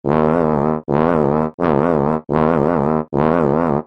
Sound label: Hurt Voice; Wolfgang